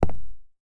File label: Footsteps Concrete Shoes; starbites